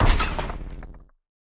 Break Warhammer

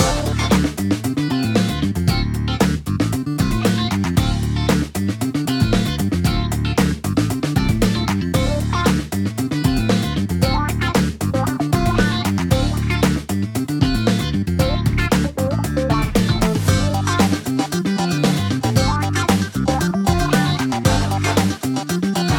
Kart Racing Main Title Loop

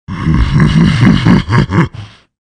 Zombie Lanuge; Wild West Shootout (Arcade) (gamerip) (2019)

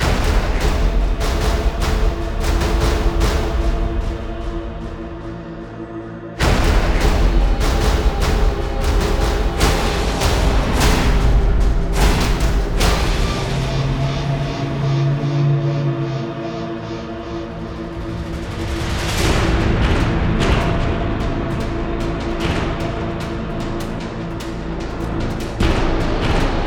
Base Loop low; extinction deluxe edition; ps4 windows xbox one

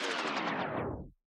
Drone Death

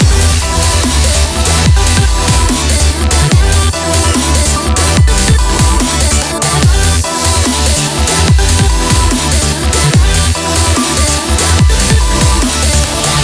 Results Screen Loop; beatmania IIDX 29 CastHour; System BGM

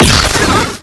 Swarm Shoot; Swarm SFX

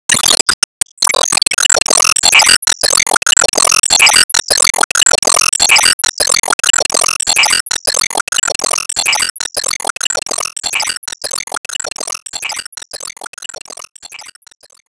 Tidalwave Generate

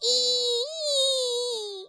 Death Spider; Miner Max

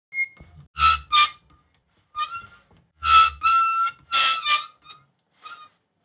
Swings; Smeshariki Cartoon Studio

